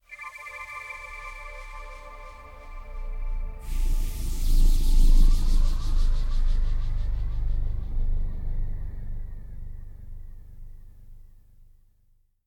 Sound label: Space Wind Radiations